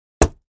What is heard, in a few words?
Axe Paneling 1 Side, axe hits